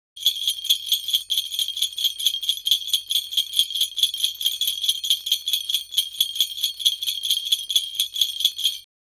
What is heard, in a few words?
Jingle bells shaking with a steady rhythm